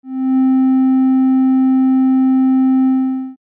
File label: triangle